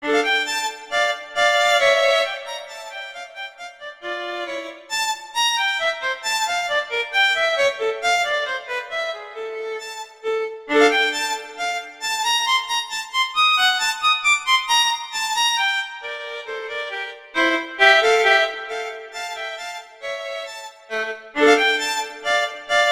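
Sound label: Allegretto, violin; Reger; Suites; Suite No 2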